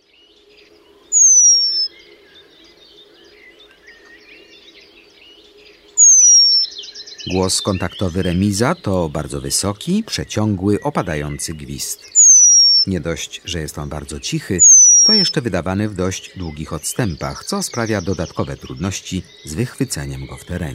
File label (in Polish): Remiz, Ptaki; Kurs PTTK